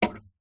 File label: clothes hanger metal wire hang hook onto metal wardrobe pole; household